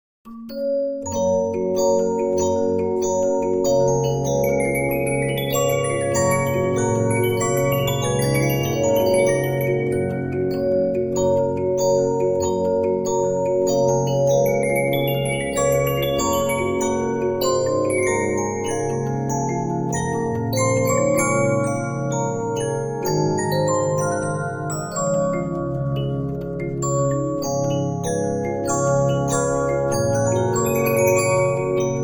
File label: Regina, 15inch disc musicbox